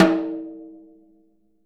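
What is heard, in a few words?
jazz snares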